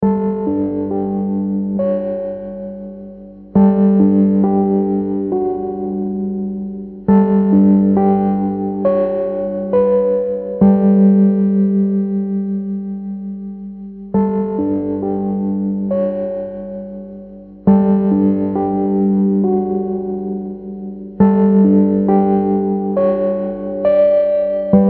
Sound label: Keyboard Loop; Keyboard Giraffe Loop